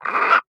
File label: Animal Primate Monkey Capuchin Growl; animals; AdobeCC